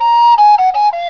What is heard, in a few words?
Joy, happymusic; 特酷声音素材库; 背景音乐